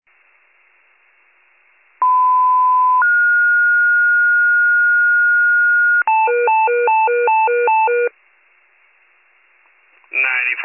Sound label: fire, pager alert